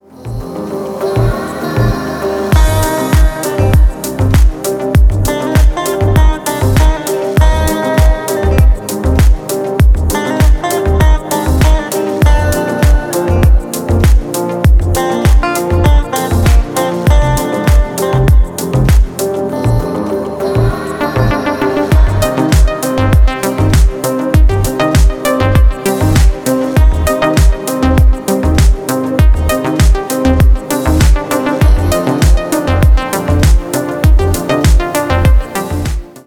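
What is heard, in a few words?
club chill notes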